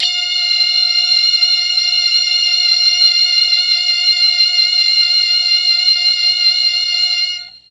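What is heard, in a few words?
F4 string brass, mellotron; STRGS+BRASS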